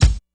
Kick Grit

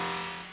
FX Broken Box; FX Hit; Drums; Ableton Live 12 Lite; Core Library

